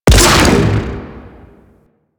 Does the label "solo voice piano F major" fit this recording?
No